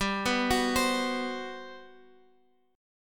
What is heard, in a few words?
G Major 11th, Guitar Half Step Down